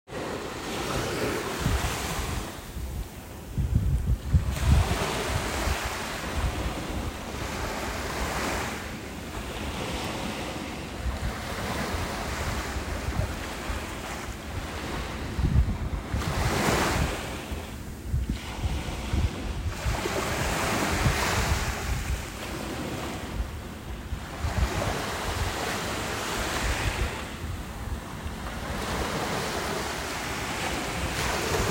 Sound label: ocean beach